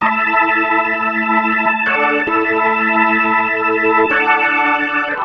Synt; FUNKIN&GROOVIN'

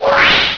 Transition; Iracle 2000

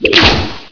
tail whip; Hexen II; scorpion

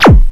thud

